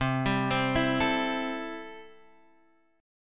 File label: C 6th, C, down, Guitar, Standard; C6 arpeggiated